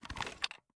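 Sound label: sniper reload empty extended mag raise; gmod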